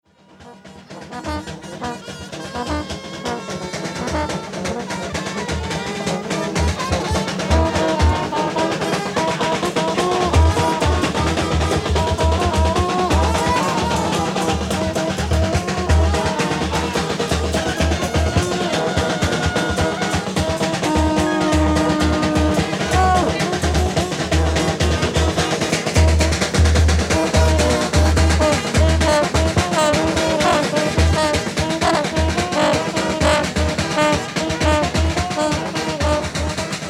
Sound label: army marching band, Bouake Cote d'Ivoire; Army brass band; ethnomusicological recordings; world music; AFRICA 1987 - 91; Ph. Ciompi